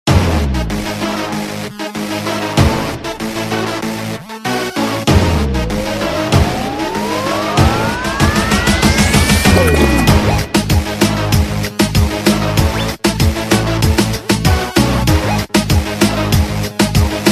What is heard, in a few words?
Instrumental Tune; Master Chef India 2